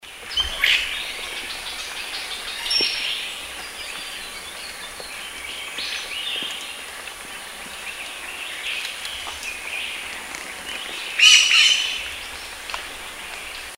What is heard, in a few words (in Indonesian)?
suara burung ekek layongan atau cissa chinensis